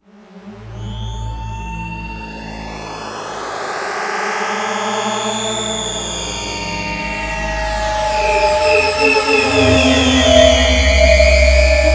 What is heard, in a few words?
Weird Rotor Poly